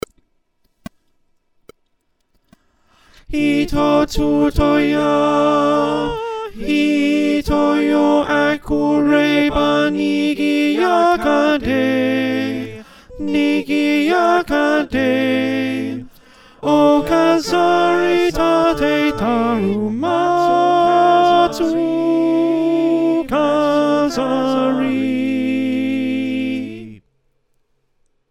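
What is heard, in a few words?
alto, choir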